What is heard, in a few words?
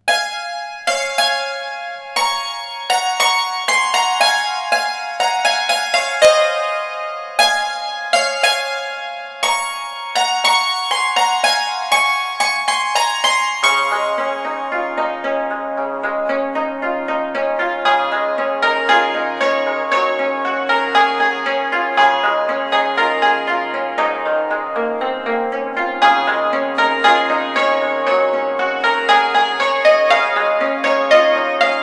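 hymns and medleys on chinese hammered dulcimer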